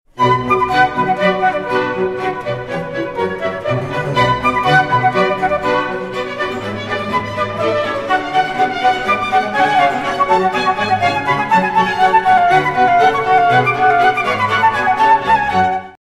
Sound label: huawei ringtone